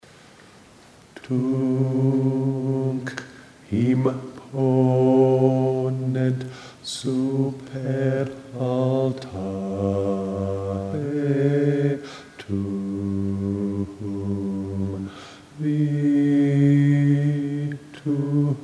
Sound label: bass; Allegri Miserere; Allegri last page chorus Bass; Lent/Holy Week 2013 Rehearsal recordings